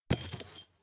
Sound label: shells 20mm ground; tanks; War Thunder